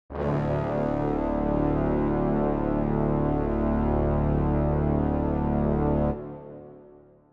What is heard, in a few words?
long brass